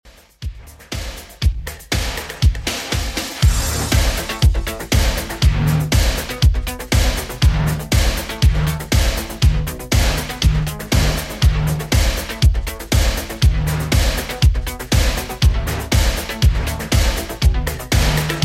80s Redrum 120bpm

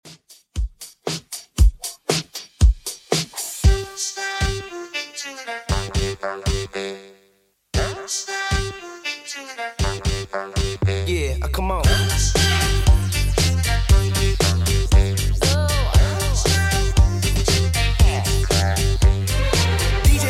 2ks Redrum 117bpm

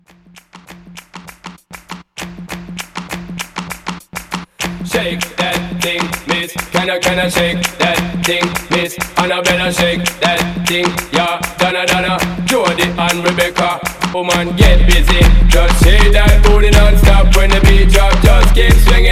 Hybrid Mashup 99

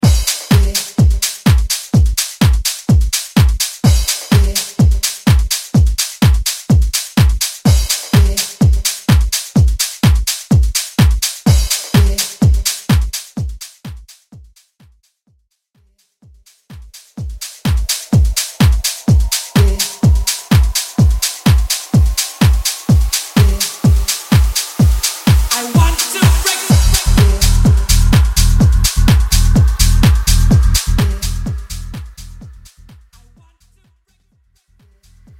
80s House Club Mix; Mainstream VIP; Vegas Edit; 126 Bpm

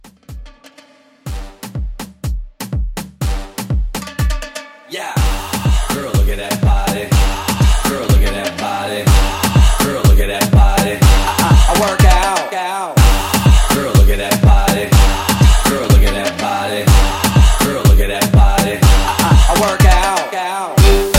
Rumba Latin, 123

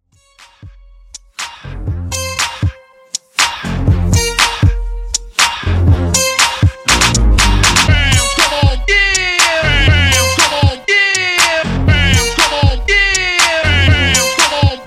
Party Intro 120Bpm

